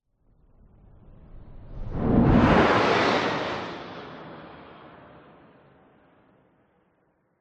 vehicles aircraft fighter jet pass by fast designed